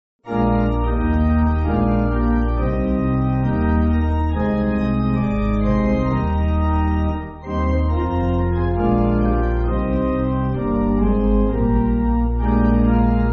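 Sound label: Pipe Organ, Backing Files for Churches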